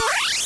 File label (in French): prospectus; MOBICLIC 13